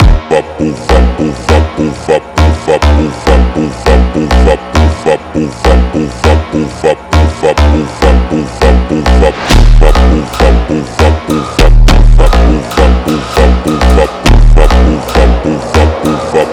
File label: phonk slowed